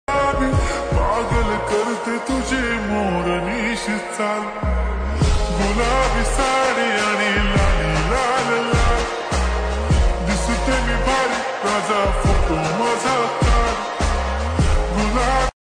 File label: song slowed reverb song ringtone